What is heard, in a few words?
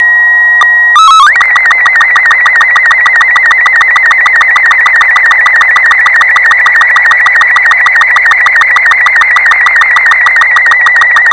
sstv